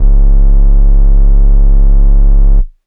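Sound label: Air Bass Kawaii C#1; keys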